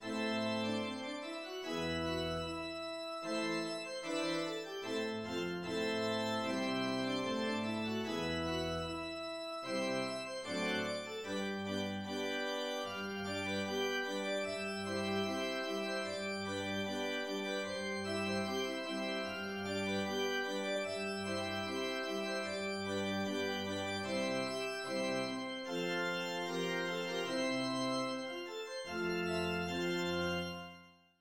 Osterakers brudmarsch

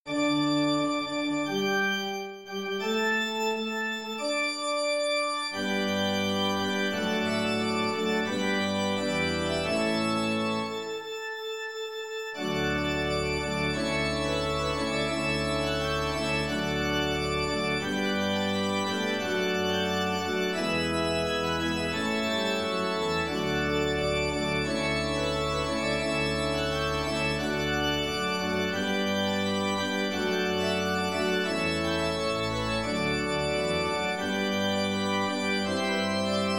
Brollopsmarsch; kronprinsessan Victoria

